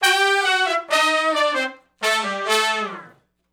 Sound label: R&B Riff (A) unison, Horncraft